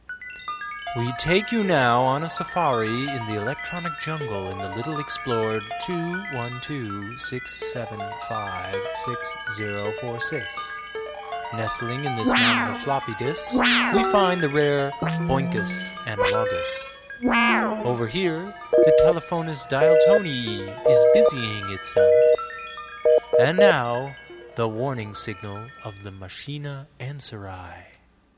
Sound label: Phone Jungle